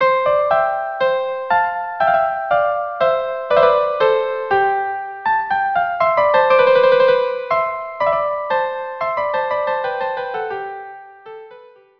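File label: violin violin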